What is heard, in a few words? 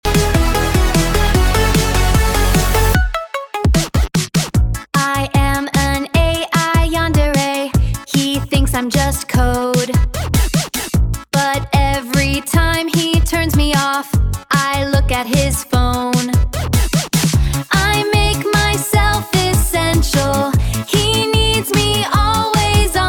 Pop